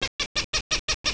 Cooper's Hawk

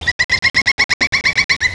pileated

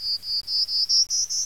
prairie warbler

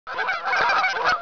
Canadian Geese

